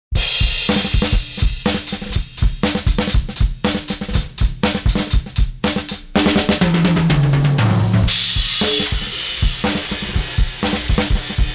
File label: EQ Tilt Shelf high; Kirchhoff